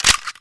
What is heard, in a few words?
usp mag out, weapons